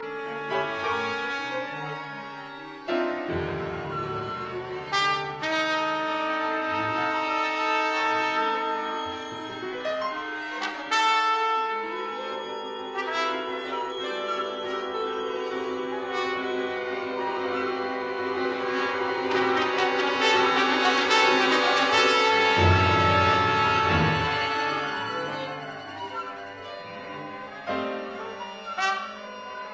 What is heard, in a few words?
Piano Concerto; Escot